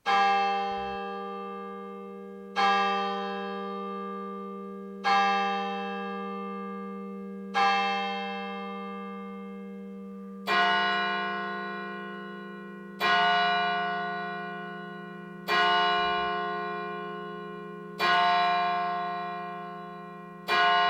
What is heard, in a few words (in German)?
Glocke 6 Uhr, Kirche; Neenstetten